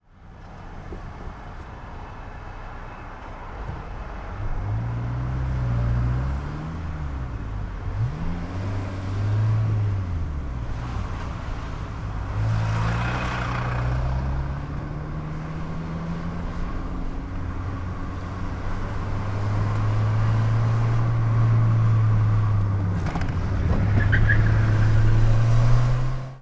peugot 206 car interior open window accelerating aggressively racing horn under bridge